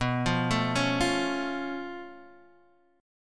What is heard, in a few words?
C sharp Augmented 7th B